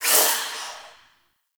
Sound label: Overwhelm D; Wisp